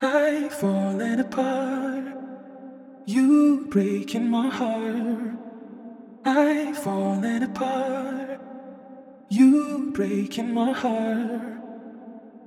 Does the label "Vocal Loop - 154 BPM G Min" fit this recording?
Yes